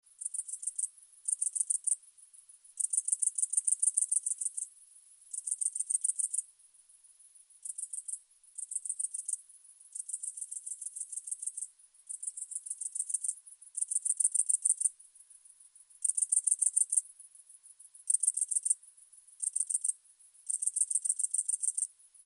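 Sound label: chirring of a single grasshopper close up; nasekomyie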